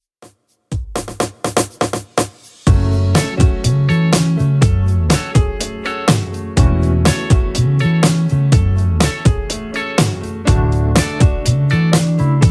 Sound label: 123bpm